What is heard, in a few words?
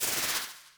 Sink; HeavenStudio; built To Scale DS